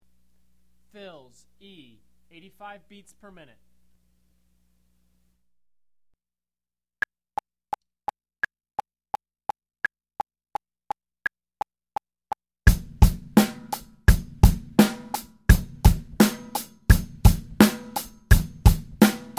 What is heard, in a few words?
Beginner Fills 85BPM; Drum Lessons